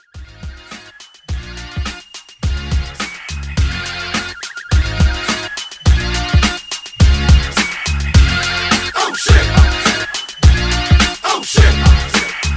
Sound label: Mashup Re Drum 105